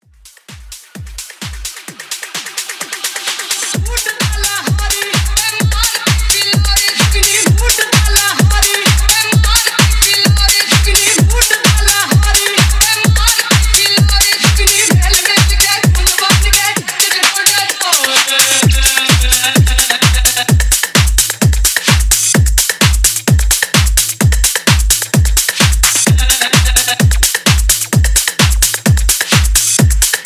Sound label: Top40s House, 129 BPM